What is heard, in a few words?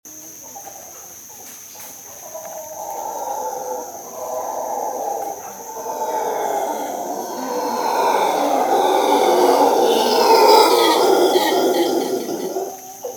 Monkey